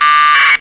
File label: packet, scanner